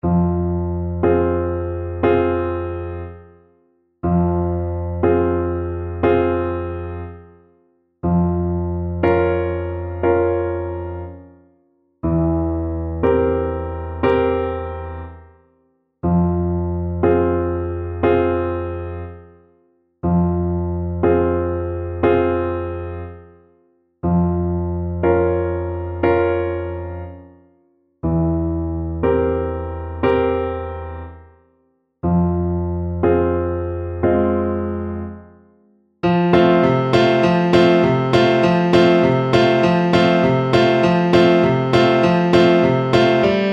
czardasz, Trombone

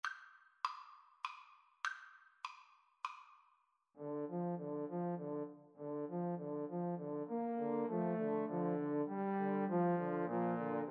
Trombone Trio; saint saens swan

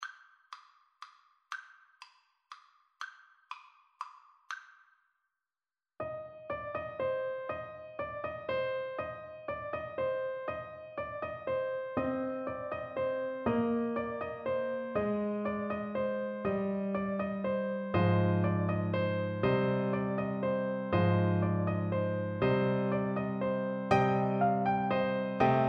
carol of the bells, soprano saxophone

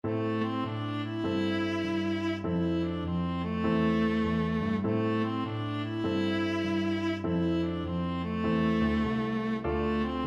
cool blues

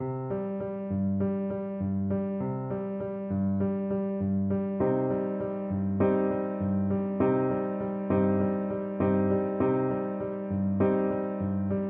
secretx trumpet

